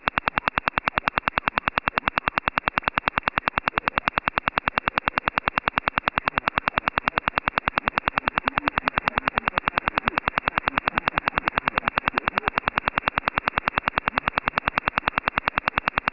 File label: Russian old OTH Radar on 14190 kHz; ABM-2